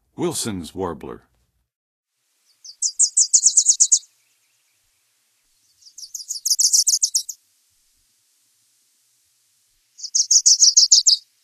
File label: Wilsons Warbler; N. America; Songs of Birds of North America; Western USA Songbirds